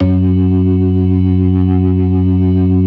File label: DRAWBAR VIB, Synth and Organ